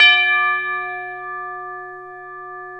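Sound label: tubular bell D4; Proteus2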